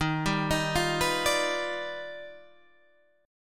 D sharp Augmented Major 9th D sharp Guitar Half Step Down